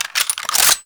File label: Saw Blade Launcher Reload; Warframe